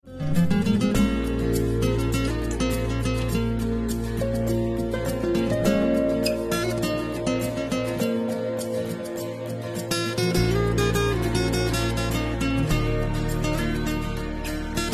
instrumental bgm

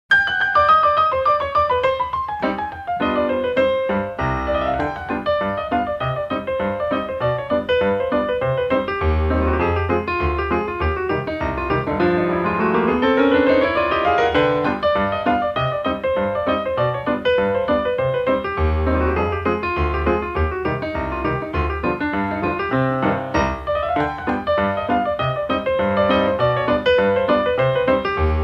Piano BGM Ringtone; Joker; Joker OST

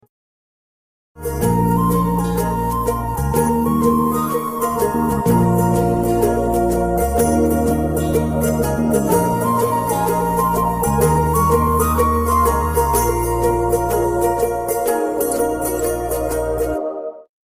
instrumental

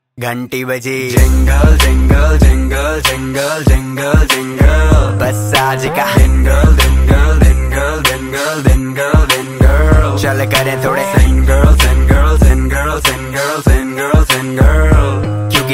Jingle Bell

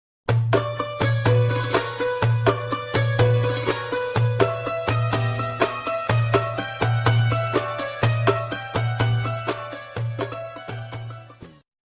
instrumental

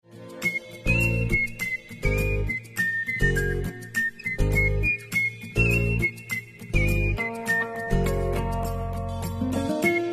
Romantic Whistle Bgm

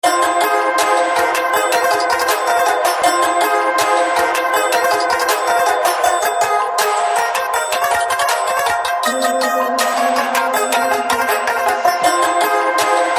Telugu Ringtones and BGM; Disco Raja

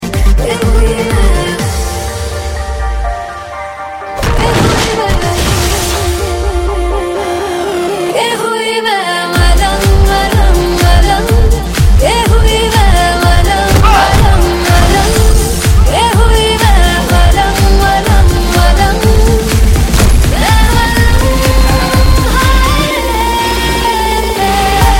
Song Ringtone

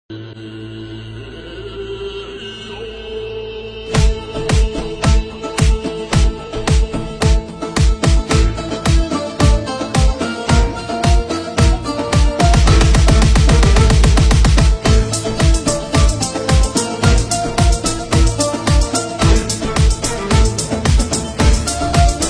remix Ringtone; Ertugrul

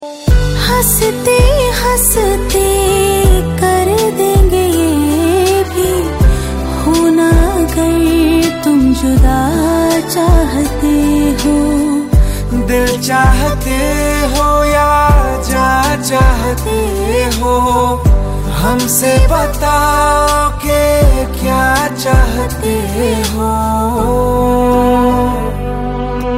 Duet Song Ringtone

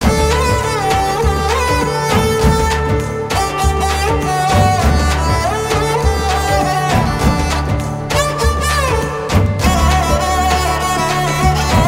Ringtones BGM, Tamil; Ka Pae Ranasingam

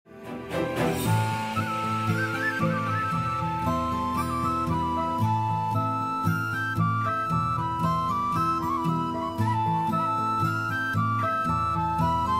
sad bgm

